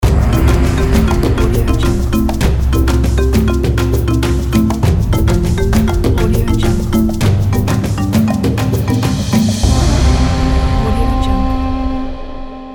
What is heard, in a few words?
african logo